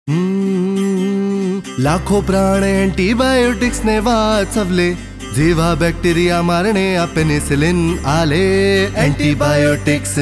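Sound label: Antibiotic Jingle Marathi; jingles in 19 languages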